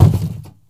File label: Drop Cardboard Box